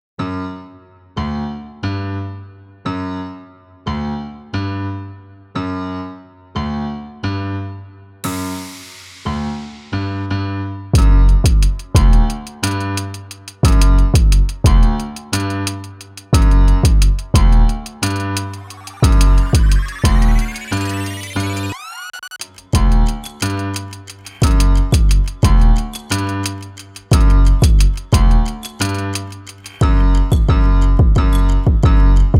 Waka Underscore, Urban; Narrative Mind

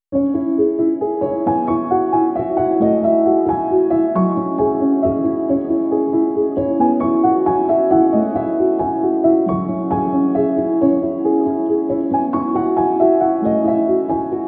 Light On The Fields, Period Drama Emotive Piano

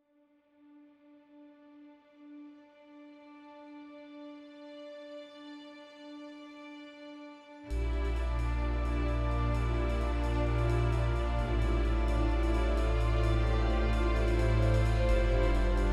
Underscore, Percussive Motion; Narrative Mind

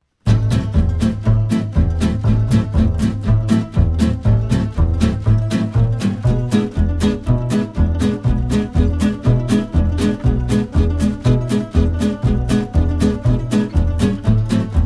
Petriarka Underscore, Jazz Manouche

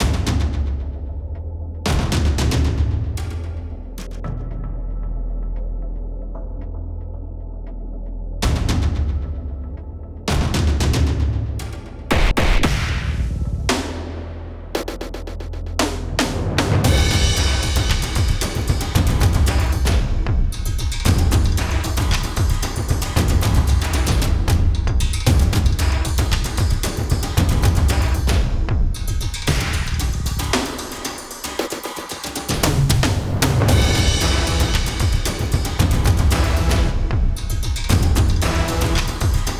Rain, Hard Hitting; Narrative Mind

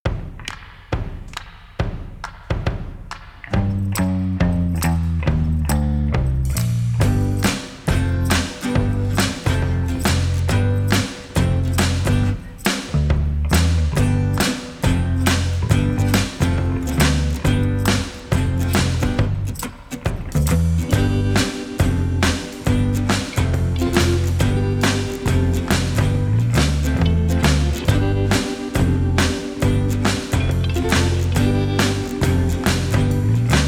Gipsy Underscore, rock n roll surf rock; Narrative Mind

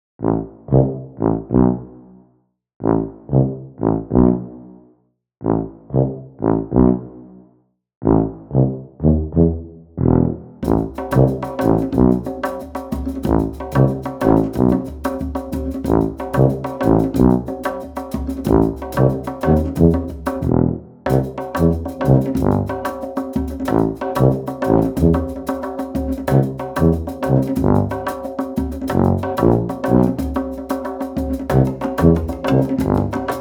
Underscore, Balkan Brass; Narrative Mind

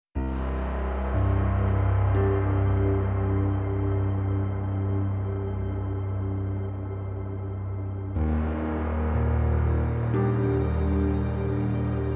Stream Underscore, Percussive Motion; Narrative Mind

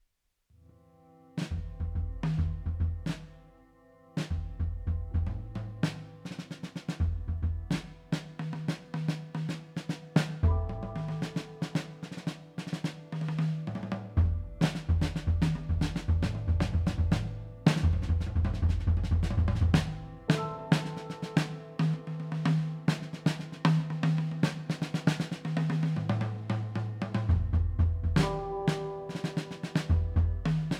Growing Tension Jazz Improv; Narrative Mind

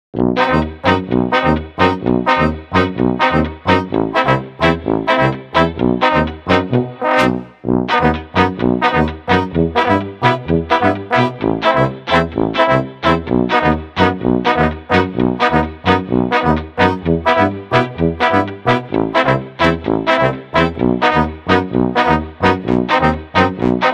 Underscore, Balkan Brass

